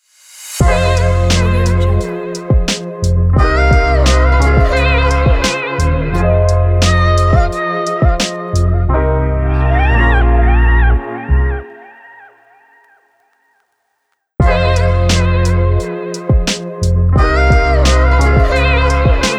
lofi hiphop logo

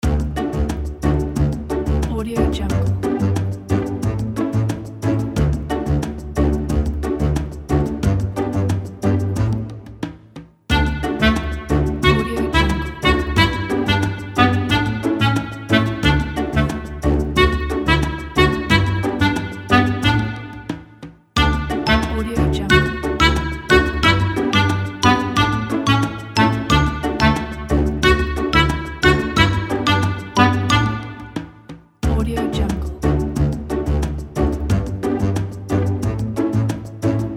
the comical

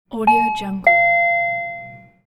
door ringtone; 5trix

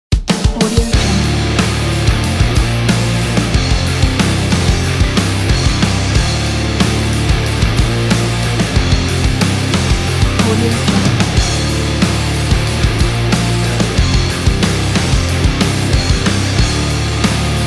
positive energy rock

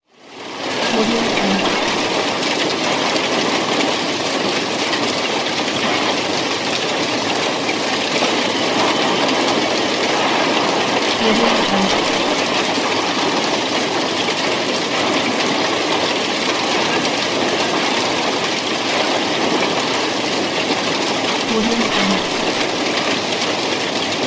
building fire

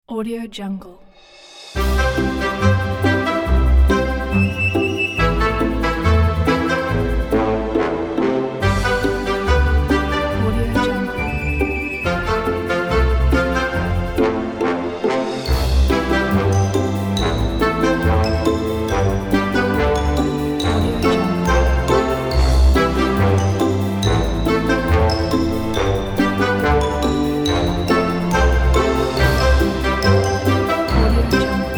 funny

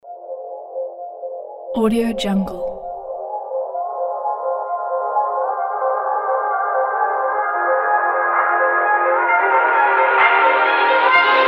dramatic sport epic rock trailer

